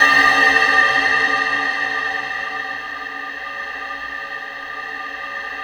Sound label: steel, odd bells; Spectrasonic Distorted Reality 1